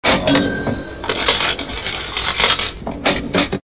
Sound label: Cash Drawer